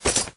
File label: valk step